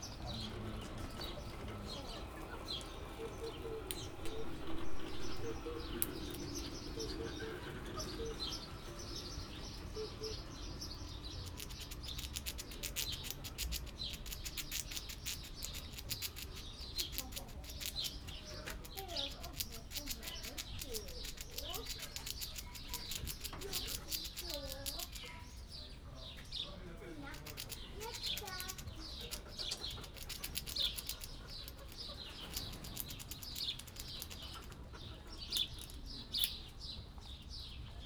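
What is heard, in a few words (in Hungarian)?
diótragicsálás, kanadai vörös mókus; debreceni zoo 2019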